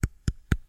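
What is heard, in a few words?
sounds of tapping finger on microphone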